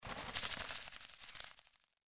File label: wing turn; npc; cazadore